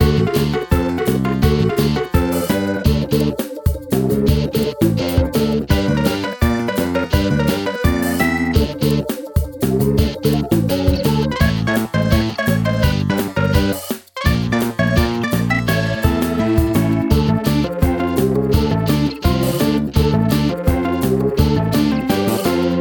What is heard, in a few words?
music fast; The Loud House - Extreme Cardboard Racing; NextlevelGames